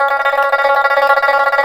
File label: PIPA tremolo C#5; Spectrasonics; Heart of Asia